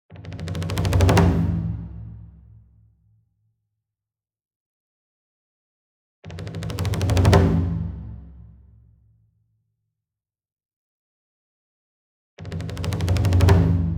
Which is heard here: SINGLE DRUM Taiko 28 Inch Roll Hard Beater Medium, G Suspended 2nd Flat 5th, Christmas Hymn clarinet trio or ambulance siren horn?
SINGLE DRUM Taiko 28 Inch Roll Hard Beater Medium